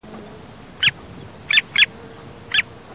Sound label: stercorarius longicaudus